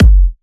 128BPM Kick; Vengeance Samples; Suisse Kit

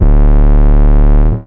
Bass D, Root D

